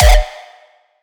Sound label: raw kick